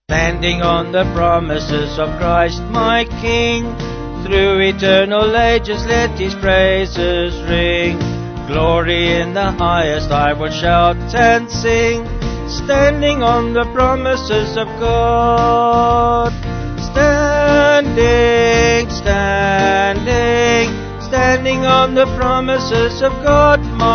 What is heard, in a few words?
Vocals Band; Standing On The Promises; Carter